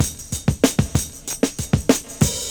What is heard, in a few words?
OLD SCHOOL, Breakbeats